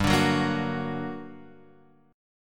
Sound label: G Minor Major 7th, down, Guitar, Open F; GmM7 strummed; JGuitar